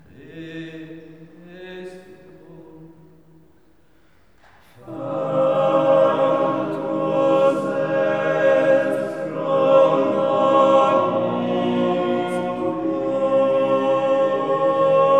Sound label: Christus factus est Chorale; de l eglise Saint Georges